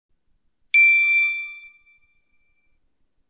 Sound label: light chime, blackberry bold 9700